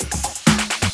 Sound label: Fill Ins 128BPM; Vengeance Samples